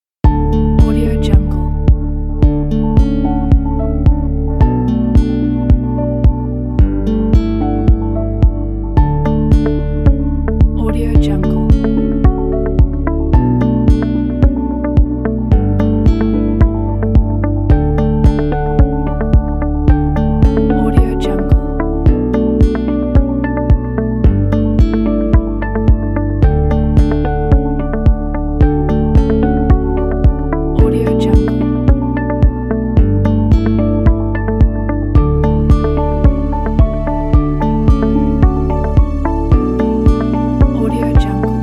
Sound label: ambient music